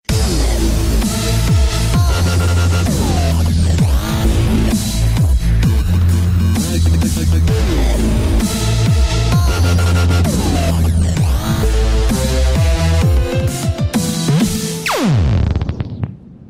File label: Dubstep Alert